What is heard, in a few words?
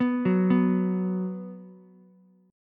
B 5th B Ukulele, chord; arpeggiated